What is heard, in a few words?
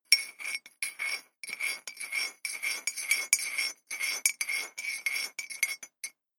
Stirring Spoon In Ceramic Mug; Foley Sound Effect; Clink Sound Effects